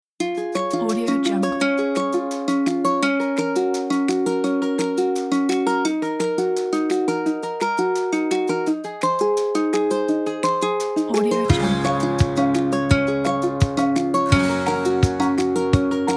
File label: happy baby kit